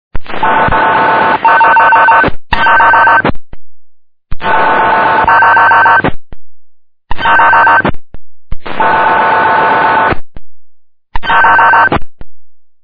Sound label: 1721 mhz signal